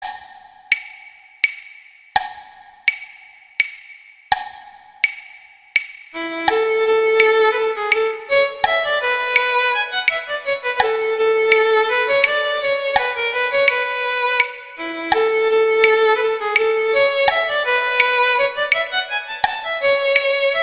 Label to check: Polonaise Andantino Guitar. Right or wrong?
Right